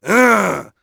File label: attack voice; UFC Tapout; XBOX